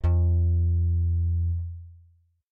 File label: f1 bass; matching game